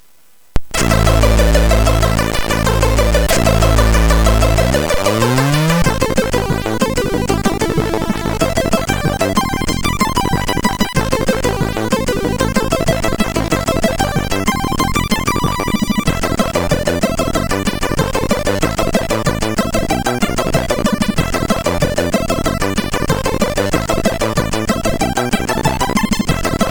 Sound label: sid, MOS6581R4